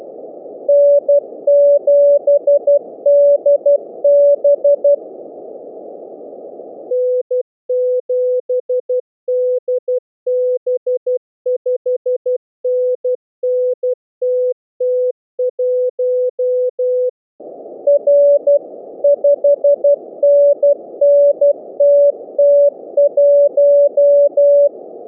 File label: MorseRunner